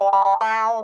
box wah, funk guitar; Zero G - Funk Guitar

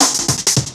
Break, Drum N Bass Percussion

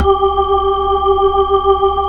B-3, chorus; Br. Ch. Xfade

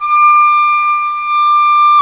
WND OBOE, Oboe Room

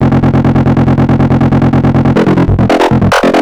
Arp Pulse, Synths; Sonic Foundry (Sony Creative Software); Electronic Point Blank

